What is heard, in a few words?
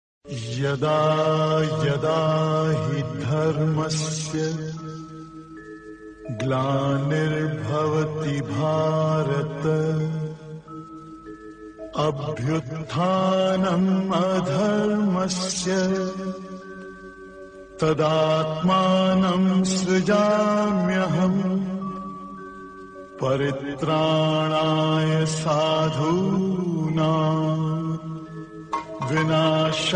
Bhakti Ringtone